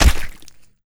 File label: Flesh Impact; zps